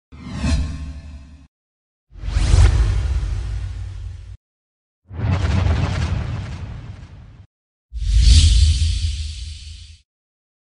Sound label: Whoosh; Whoosh Pack 2